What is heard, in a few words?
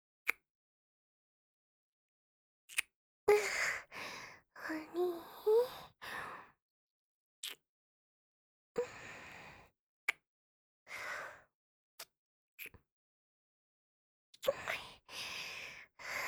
kiss